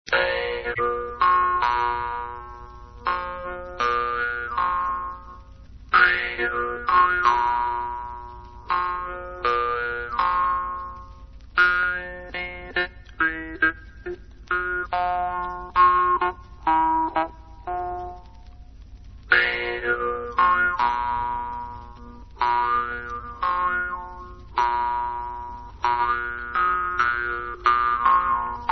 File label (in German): Maultrommel